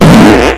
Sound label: earrape fart